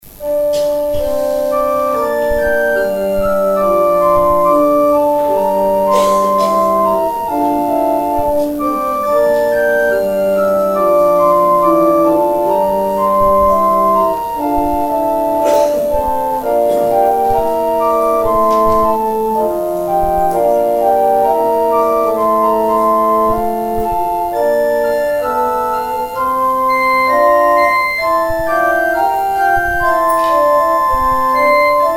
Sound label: organ interlude